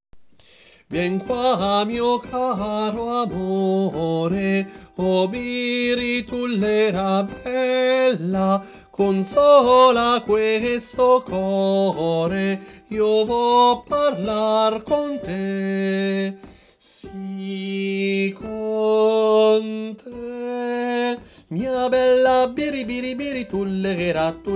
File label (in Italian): Soprani Biritullera; Coro Isola del Giglio